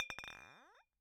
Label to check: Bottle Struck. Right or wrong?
Right